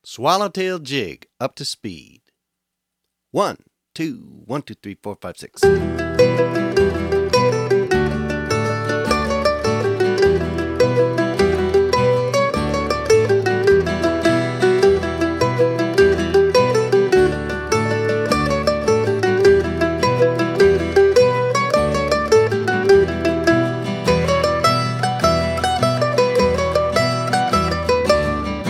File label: Swallowtail Jig